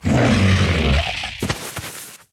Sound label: Hellhound Death; Puzzle Chronicles; PS3; PSP; X360